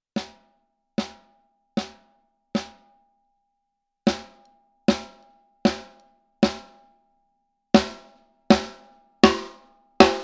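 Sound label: Gretsch Bell Brass high, snare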